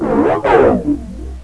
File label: pit drone communicate; svencoop; sectore